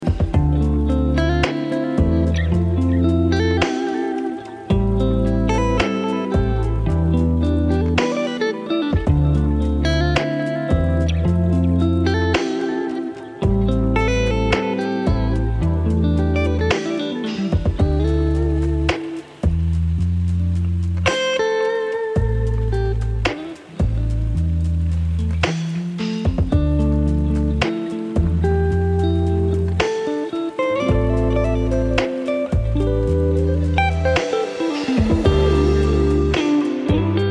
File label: Dusky Chillout Grooves; Dusky Chillout Grooves Vol 1; Vanilla Groove Studios; serene and atmospheric